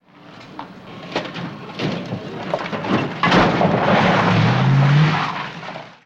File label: Car Rattles Thwack Re